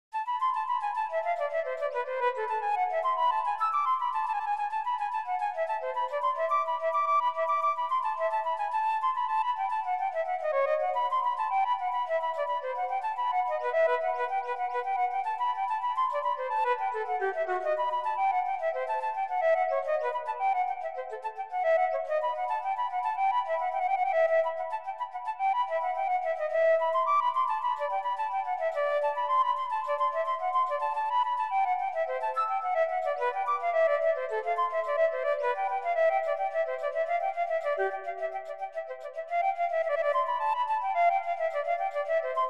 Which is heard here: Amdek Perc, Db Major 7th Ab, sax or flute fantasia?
flute fantasia